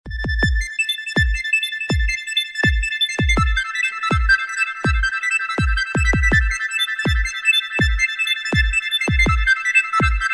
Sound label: panasonic ultimate ringtone